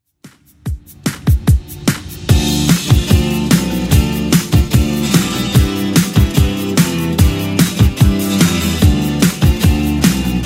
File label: 80s Redrum 147bpm